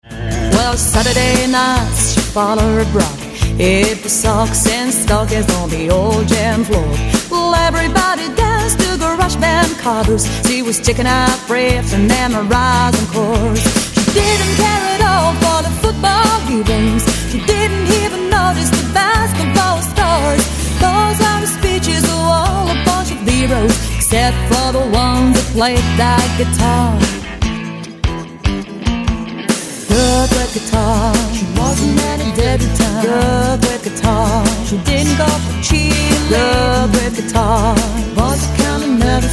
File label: Girls With Guitars